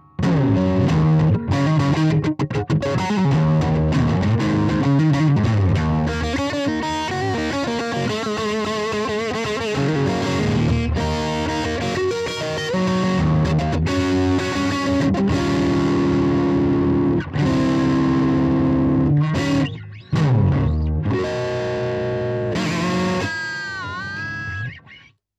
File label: strat drive